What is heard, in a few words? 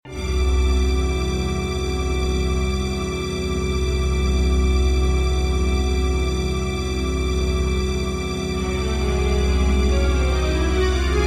alien court